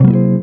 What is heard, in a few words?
EGUIT CHORDS; Best Service; World Colours